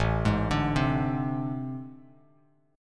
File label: A Minor Major 11th, Bass; arpeggiated; JGuitar